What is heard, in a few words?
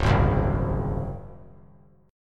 C sharp Major 7th Suspended 4th; strummed; chord; 1,3,3,4; JGuitar